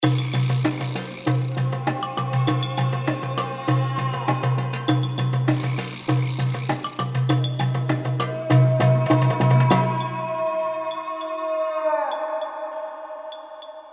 Tribal

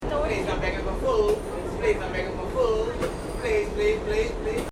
pan

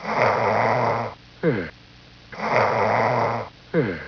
zzzzzzz

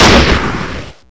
RIFLE

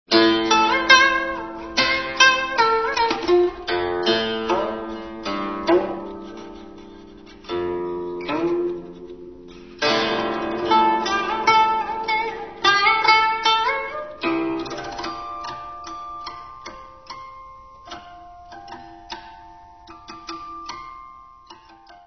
mao quin music

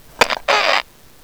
Slam

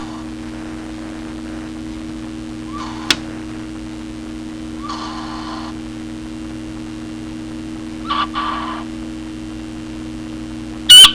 Buttonquail

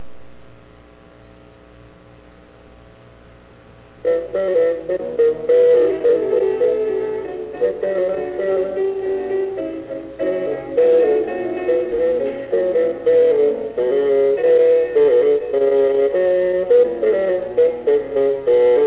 bassoon

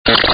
ring

